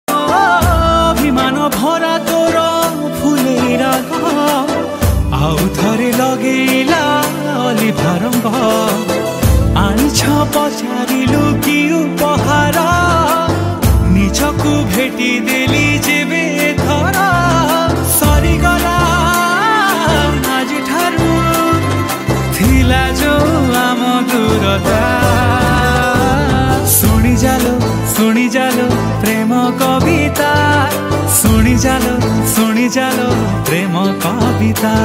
Odia Romantic

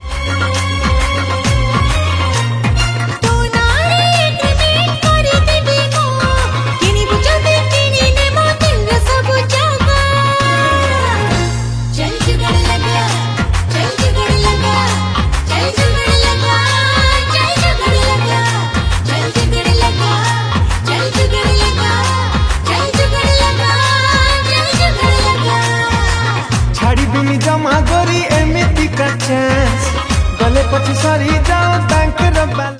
Odia Song

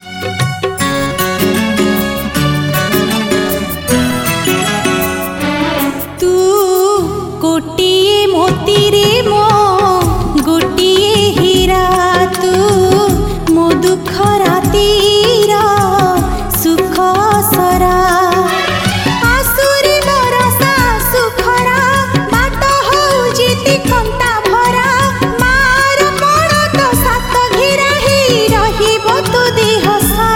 Heart Touching Love Song, Odia

